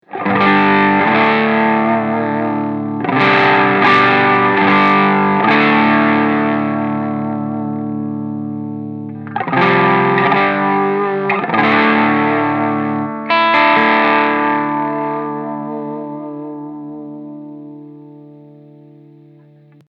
Gain Linksanschlag Stegpickup

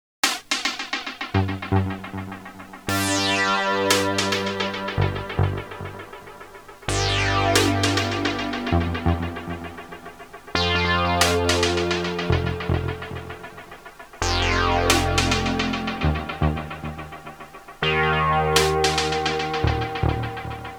Rhythmuseffekt und Bass

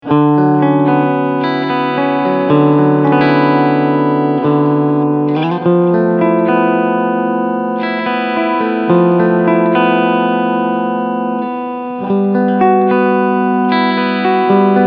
Steg Pickup SC Mode

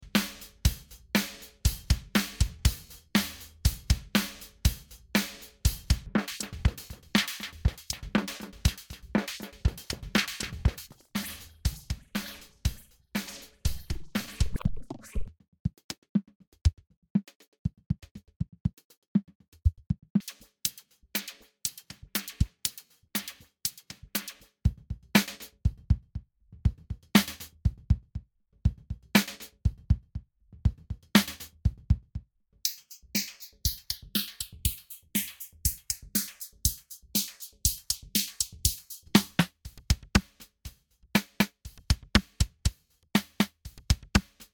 Alligator frisst Drumloop verschiedene Presets

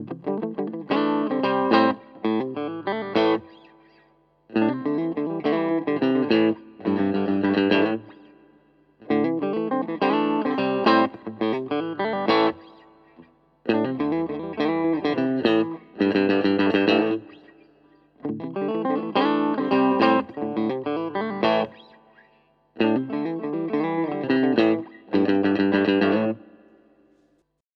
Harley Benton Vergleich HB Clean; Maybach

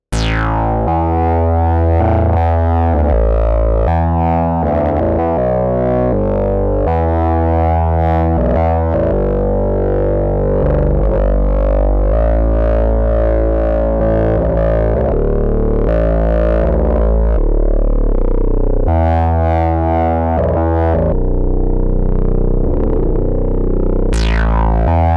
Knarzbass; Beepstreet Dagger

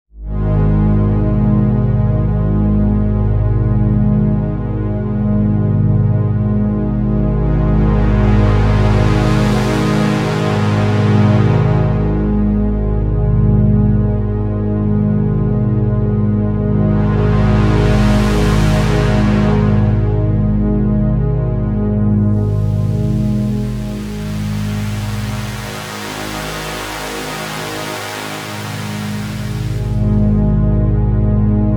OB-E Filter Mode Resonanz 0

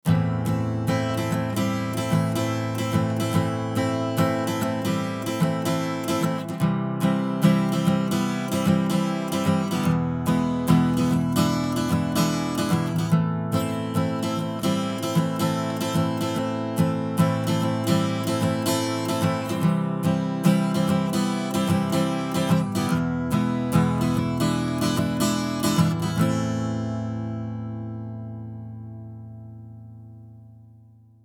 Gitarre EQ Tube 3 dB Gain; Gyraf Audio G14-S

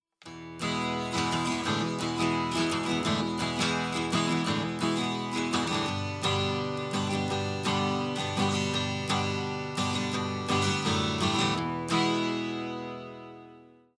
Guit, Preamp, Input voll übersteuert

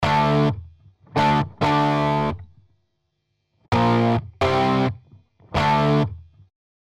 E-Gitarre Dist OPAMP Push Guitar A Bit More

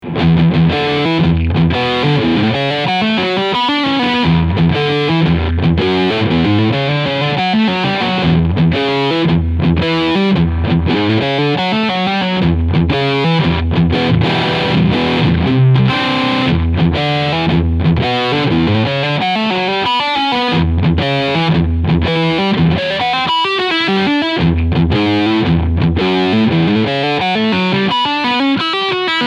Maybach Lester Wild Cherry 59 aged Riffsound Steg Pickup

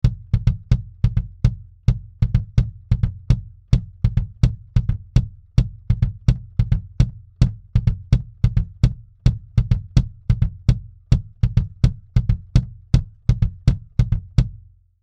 BD, 35 Prozent Gain; API 512v

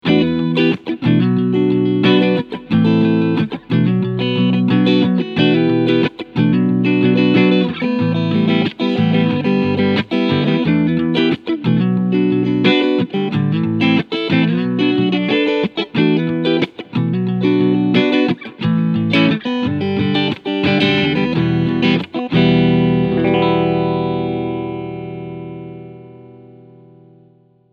Ibanez AZS2200F beide PUs Clean Strumming

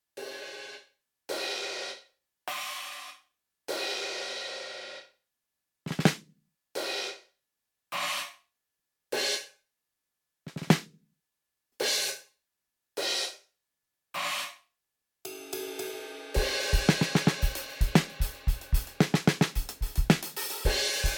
EFNOTE PRO 703 abgestoppt; Hommage

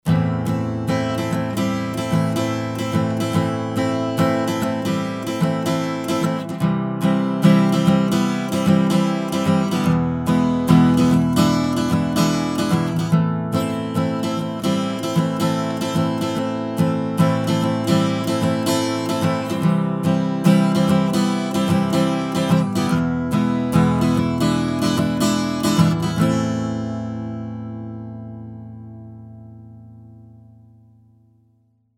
Tube Tech HLT 2A Western Gitarre ohne HLT 2A

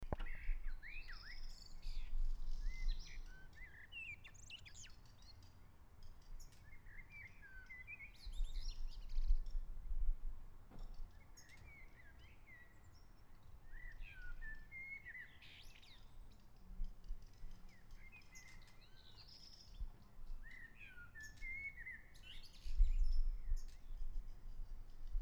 Aufnahme ohne Windschutz

